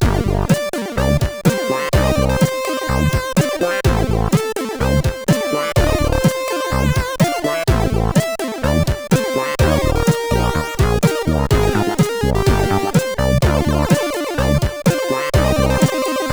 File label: 30 Seconds Jingle, CSG8580R5